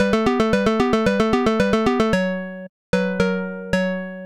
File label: Stage Clear; bible adventures; genesis